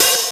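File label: LN2 open hi-hat; 90 sSampleCDs; Crypton - DrumBase5000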